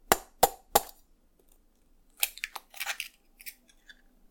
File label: cracking egg; cooking